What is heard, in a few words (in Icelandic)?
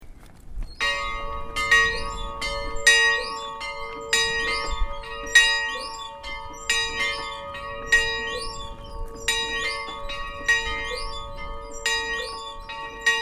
stadastadarkirkja, kirkjuklukkur